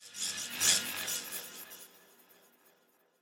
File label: horror creepy sweetener; soundscrate